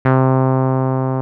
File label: TB 303